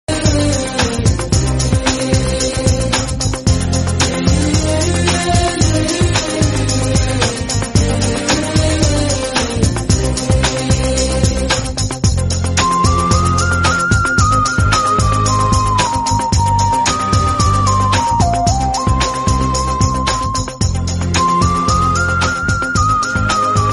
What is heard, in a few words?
Telugu Flute Ringtone; Ghajini Movie